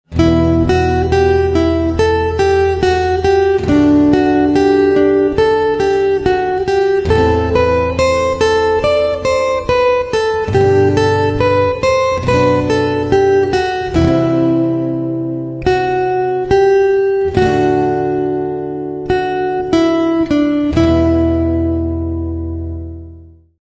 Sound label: BGM Guitar; Movie; Chennai Express